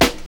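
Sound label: snare drum; E-Lab; X Static Goldmine 1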